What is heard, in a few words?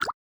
running water, river sounds